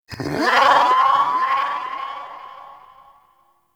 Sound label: Snow Critter Vocal Captured Lift Away; Hunting Critters